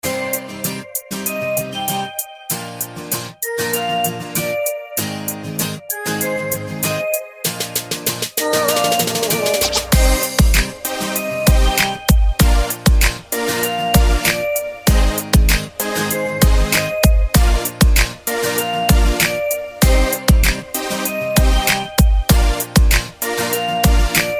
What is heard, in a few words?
Love Guitar